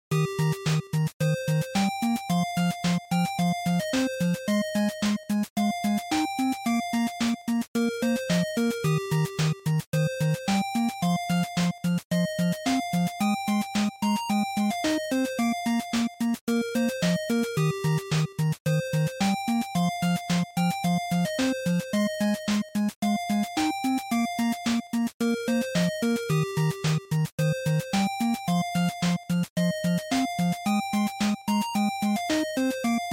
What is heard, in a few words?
NES Stage